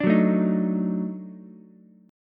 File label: Eb Minor Major 7th Flat 5th Eb down Ukulele; EbmM7b5; strummed; JGuitar